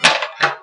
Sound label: Button Press; Marianne Gagnon